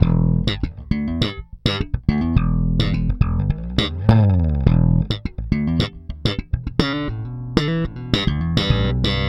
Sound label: Slap, Bass; Bass X